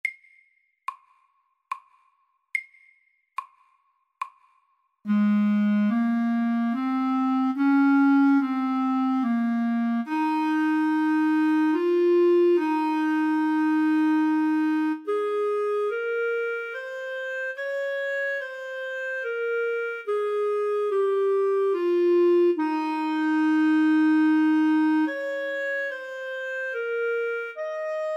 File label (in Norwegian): Legato etyde SAKTE